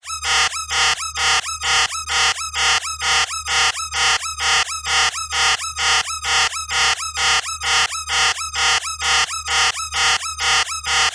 science fiction alarm siren warning two tone digital medium